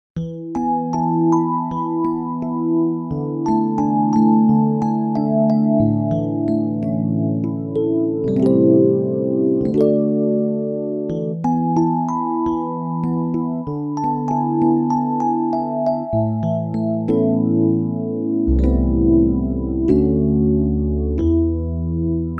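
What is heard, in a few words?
Piano Chorus, synth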